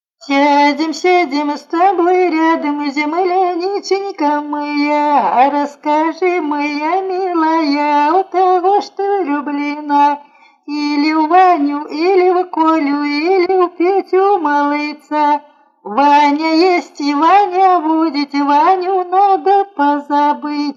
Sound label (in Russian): частушки; Сядем, сядем с тобой рядом